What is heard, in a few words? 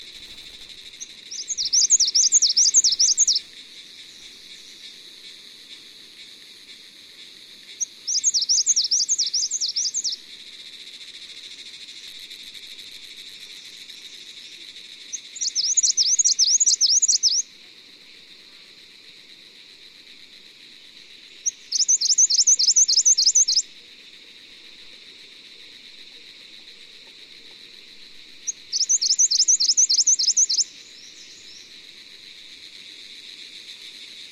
Phylloscopus reguloides Blyths; Yuksom